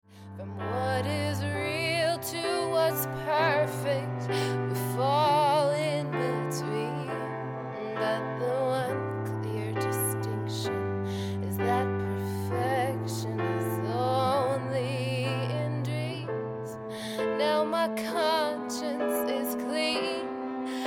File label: Vocal Piano and Cello